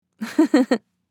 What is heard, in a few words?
Female chuckles